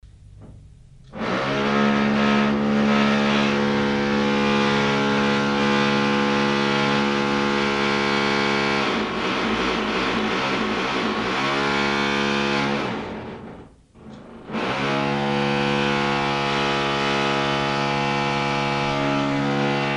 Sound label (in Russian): remontnye raboty